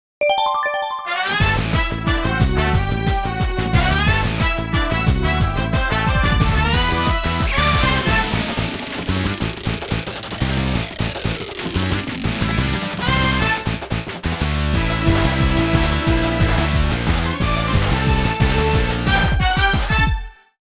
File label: Bonus Roulette BGM